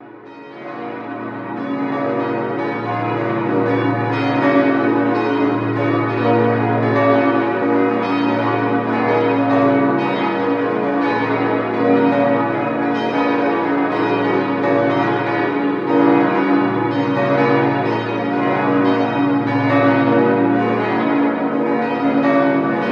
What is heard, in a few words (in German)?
Glockengeläut von Maria Laach